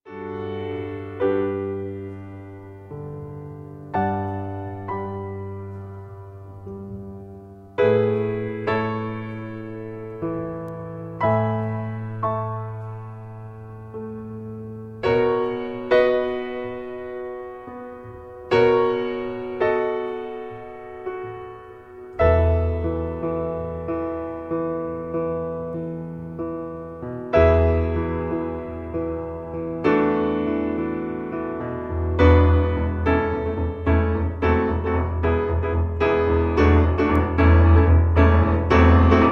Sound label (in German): Vater Unser Instrumental